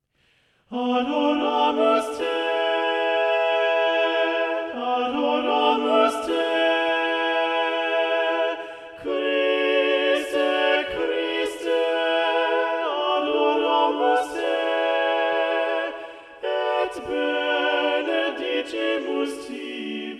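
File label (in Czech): vše hlas, Ženský smíšený sbor; adoramus te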